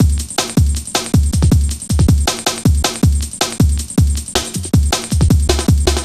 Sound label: Drum Loops, 158.5; Jungle